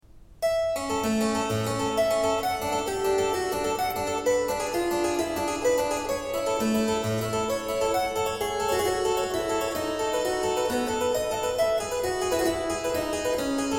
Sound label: Keyboard Partita 1 In B Flat, Gigue; Johann Sebastian Bach; BWV 825; 6. Gigue